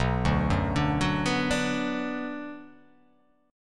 B Minor B

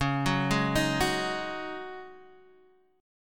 Db Suspended 2nd Suspended 4th, Guitar, Standard; x,4,4,1,4,2; arpeggiated

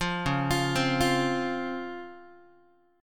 Db Major 7th Db down Guitar; arpeggiated; JGuitar

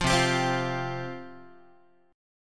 C Suspended 4th C Guitar; strummed; JGuitar

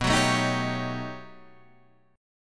B 7th Flat 13th B, guitar chord

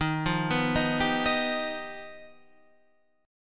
G Augmented 7th Eb

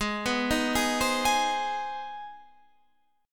C Minor Major 7th Sharp 5th Ab, chord

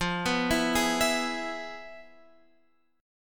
F Minor Major 7th Flat 5th

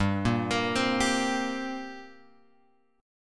G Minor Double Flat 5th, G; {3 1 X 3 1 3} arpeggiated

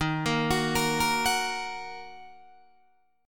Eb Minor, Guitar, Open D

